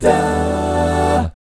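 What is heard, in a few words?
E minor 7, Jazz, 2 GUY CHORDS; ILIO; Vocal Planet VOL-3; Jazz & FX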